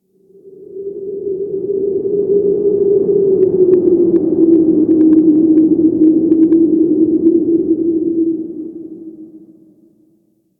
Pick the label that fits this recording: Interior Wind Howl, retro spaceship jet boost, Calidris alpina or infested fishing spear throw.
Interior Wind Howl